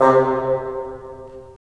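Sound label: wind bassoon; LA Composer vol. 3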